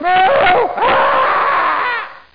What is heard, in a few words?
SCREAM